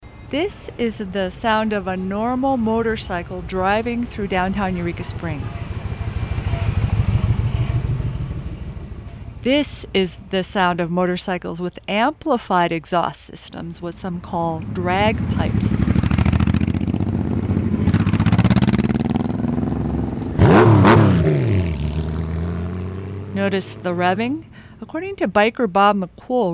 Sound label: motorcycle noise; npr